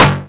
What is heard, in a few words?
short tech